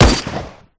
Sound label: garand, guns